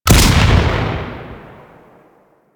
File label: Cannon Shot; Grineer